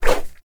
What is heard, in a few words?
Pool Noodle Whoosh